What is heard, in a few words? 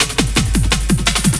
LOOP SD, Drum & Bass, 170 BPM; Ueberschall; Drum & Bass Flash Trackz